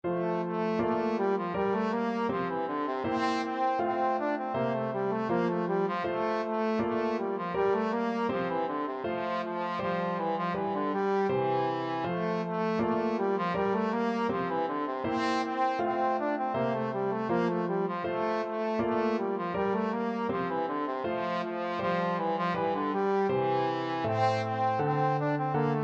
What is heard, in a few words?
gavotte, trombone